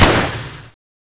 aug fire, weapons

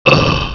pain, bloodZombie

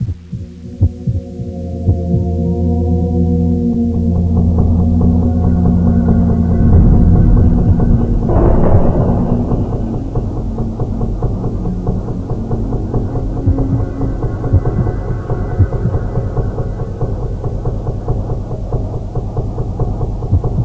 scary

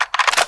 clip in

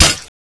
plasma blade hit

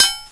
block, weapons

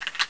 deagle clip in, weapons

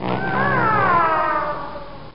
child die; tripodhopper

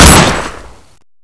luger shoot

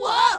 pain; gotenks

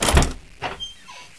control room door open

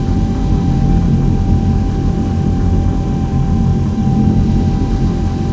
heavy drone

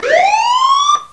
siren; vox

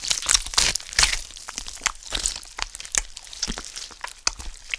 eat, bullchicken

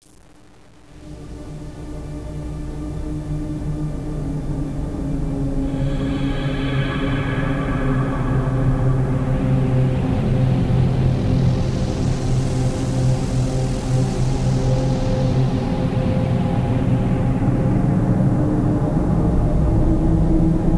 Dead Sector, ambience

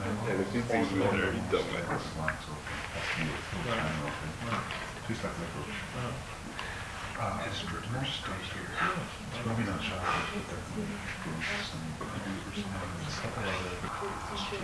lost walla

